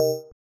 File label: BELL